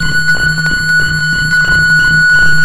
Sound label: loop, techno atmos